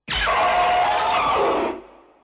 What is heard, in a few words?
Larva Roar; Battra